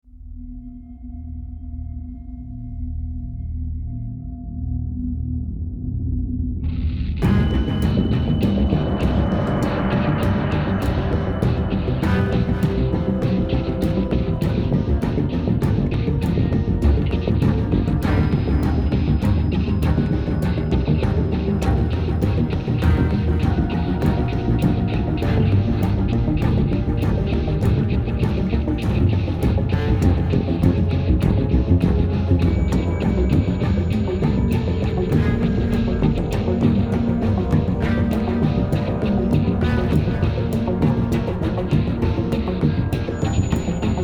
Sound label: Action loop